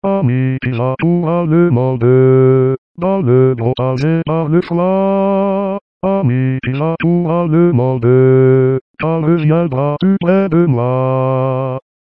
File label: bring back Basse